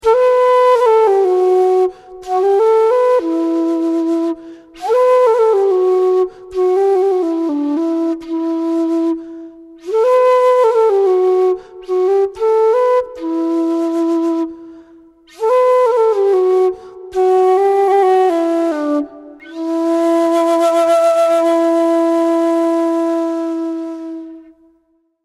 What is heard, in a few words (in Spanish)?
axabeba